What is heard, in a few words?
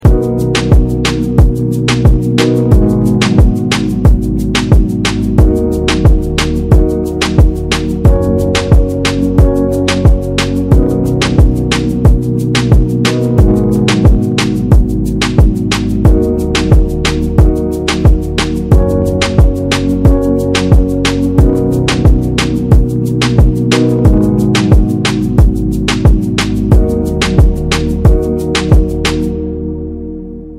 instrumental